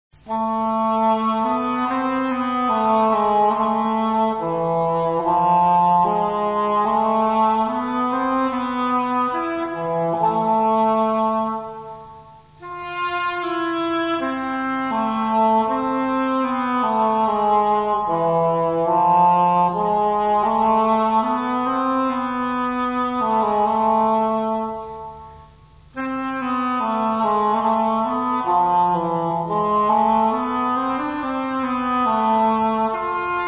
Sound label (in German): englhorn